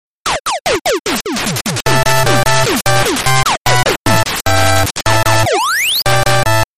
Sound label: Ironman Jingle